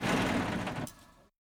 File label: vehicle frontloader turnoff; teardown; ps5 windows xbox series xs